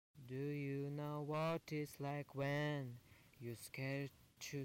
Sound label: Acoustic